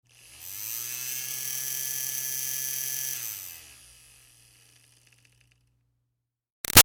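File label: Fan Small Handheld Medium Far Run Short; Electronics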